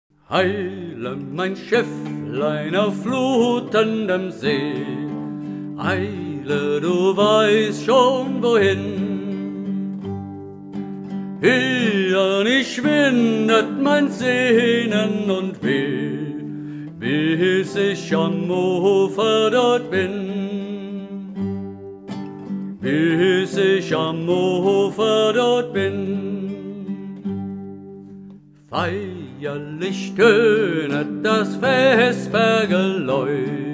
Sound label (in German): solo; abendliche kahnfahrt